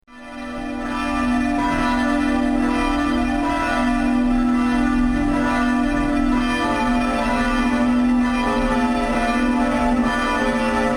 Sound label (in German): Glocken von St. Quirin